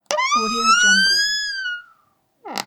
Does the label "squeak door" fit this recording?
Yes